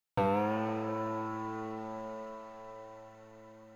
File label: ethno, 125, A